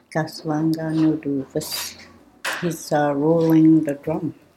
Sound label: he s rolling the drum